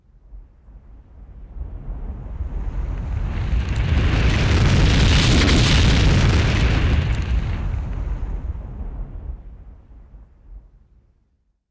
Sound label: destroy; RS Games Sounds; Battleship